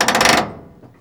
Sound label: PARKING, Transportation Doors Hoods Brakes